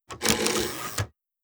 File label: low alert; Hearts of Iron IV Death or Dishonor